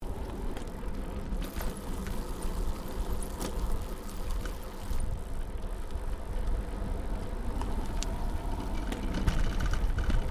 BICYCLE RIDING VARIED TERRAIN, 21 speed road bicycle on various surfaces; Giant Crx3; Bicycles